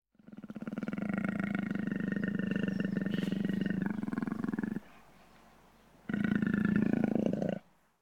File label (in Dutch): Luipaard Gegrom; KMP Film Video Sound Effects